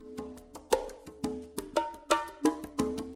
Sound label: Congas